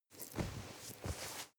nylon leather very soft

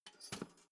toaster pull down

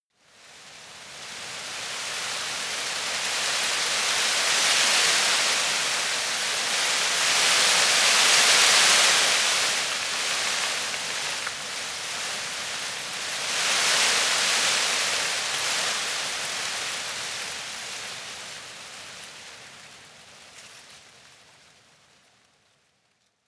tree leaves rustle medium

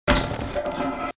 metallic impact

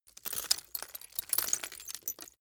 glass debris fall sequence short

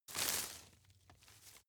grab crunch short medium

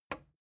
plastic button small simple push

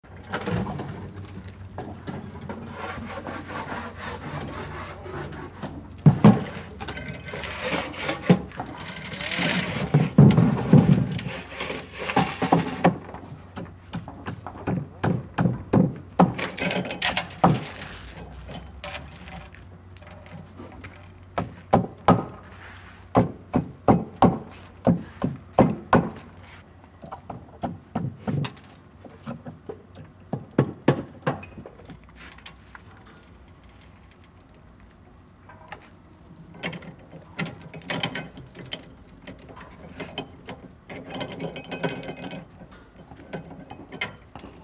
construction site atmo

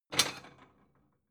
plate small take hard

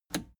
plastic button scratch smooth push enable

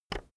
keyboard external enter push soft

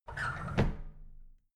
drawer big squeak close heavy

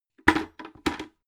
bucket put down heavy handle hit

